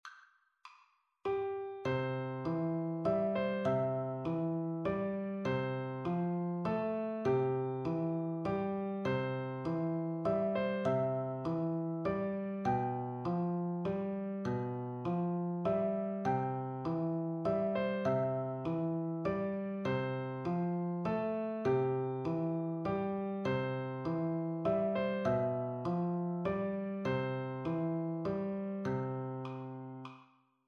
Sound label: easy piano solo; amazing grace